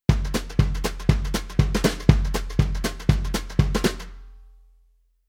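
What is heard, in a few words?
Rhythm Country pattern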